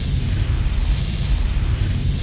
Super Dash Loop

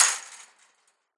Sfx Darts

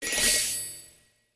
Music Frontend

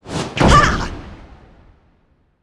Arc Draconus F Skill

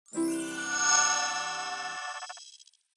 Tennis

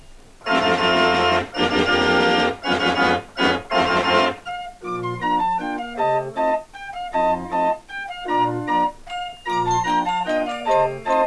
Fairground Organ Style

